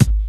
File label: crispy rap kick single shot g sharp key; kick drum samples